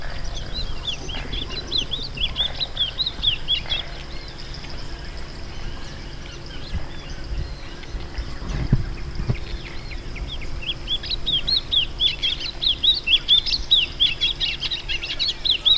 Wing barred seedeater

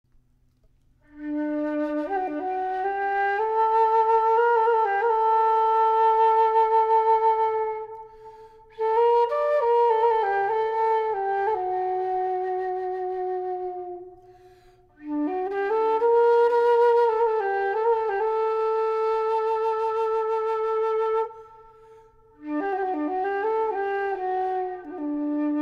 Bamboo flute

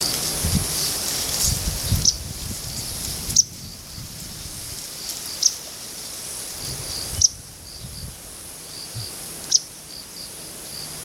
Richard's pipit; پیپت پا دراز

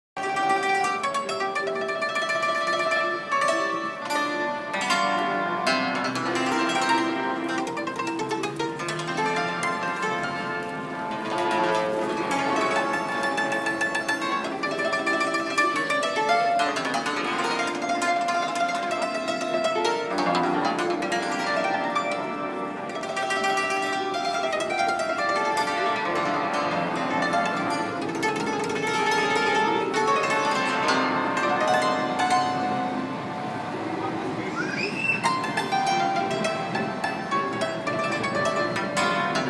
Cimbalom

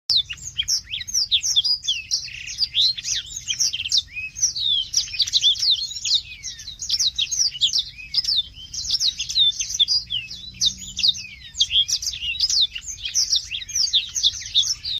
Rüppell's warbler; سسک گلو سیاه

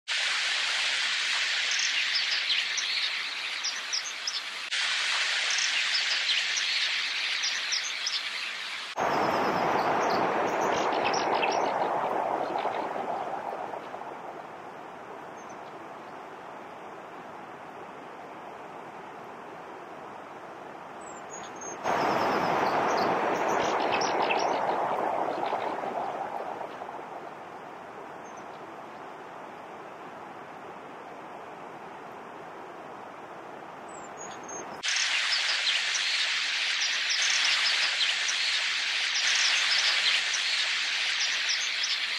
Dippers, زیرآبروک

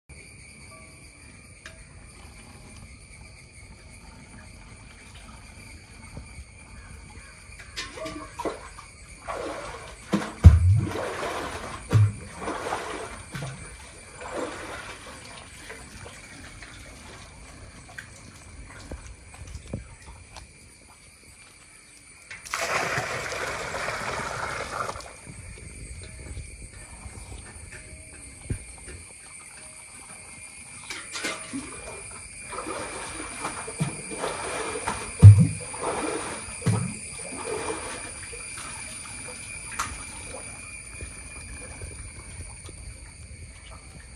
water well; چاه آب

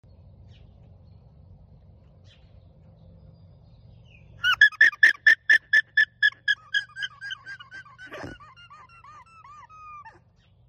alpaca; آلپاکا

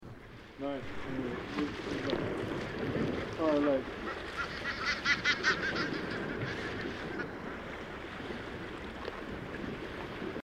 Brown booby; پرنده بوبی قهوهای

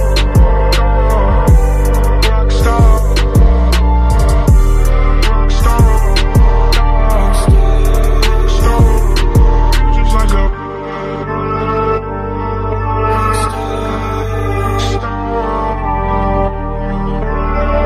ringtone

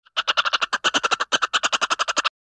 ferret

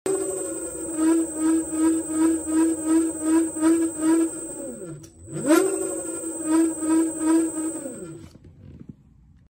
electric scooter; اسکوتر برقی

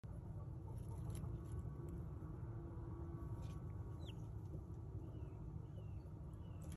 Hatching chick from egg

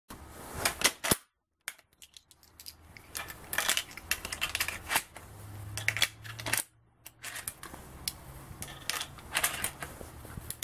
Lebel, صدای تفنگ; تفنگ لبل

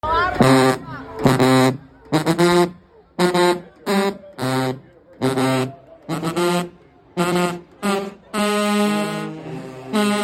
Sousaphone

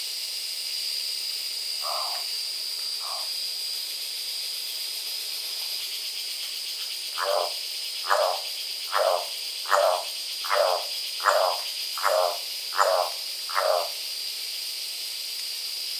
Golden collared toucanet

